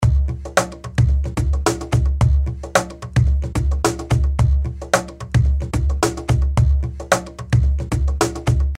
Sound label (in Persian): صدای کاخن